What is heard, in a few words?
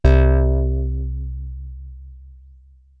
ANALOG, BASS; Best Service; Hyper Dance